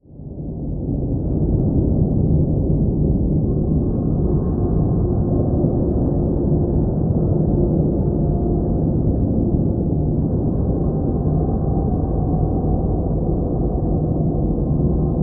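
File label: sounds of a drowning person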